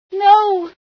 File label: pain, female sci